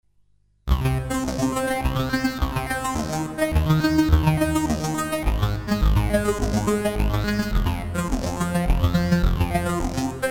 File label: Phaser Hall SID on